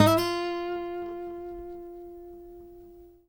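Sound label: trill, Guitar; Quantum Leap; Guitar & Bass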